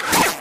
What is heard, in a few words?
flechette, ministrider